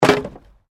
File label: Wood Drop; Material components for constructing more complex sounds; Sound Effects:Materials